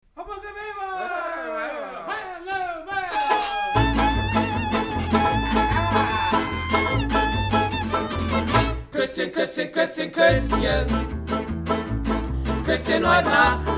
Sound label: Polka